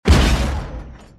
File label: slam; valkyrie npc pack; fallenlogic; rancor